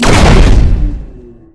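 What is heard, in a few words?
Kinetic accel, weapons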